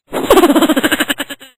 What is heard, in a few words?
evil giggle